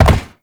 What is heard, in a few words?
land metal; Movement; Warframe; Uriel